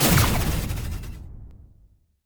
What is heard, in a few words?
block Explode Electric; explosions; Mindustry